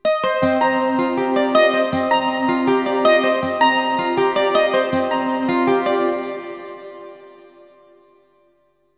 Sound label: ELECGUIT, AMBNT, 160, C; Ambient; Guitar